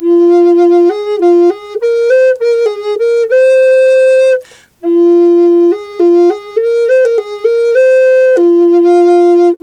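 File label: flute, 100 BPM; Didgeridoo and Other Primitive Instruments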